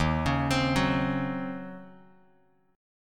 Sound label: D sharp Minor 13th D sharp Bass Standard, chord; arpeggiated; JGuitar